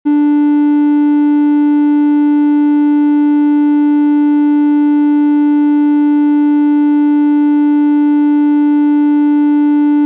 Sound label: D4 293 70